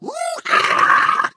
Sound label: ballbot jump